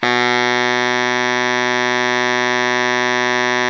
SOLO BARITONE, Pop & Funk Brass; Best Service ProSamples vol.25; AKAI

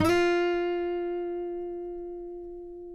COLLINGS F 2, Guitars; Spectrasonics; Hans Zimmer Guitars VOL-2; FNGSTYLE PFM

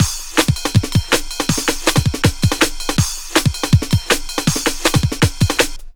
161 The Break, breaks; m8 breaks

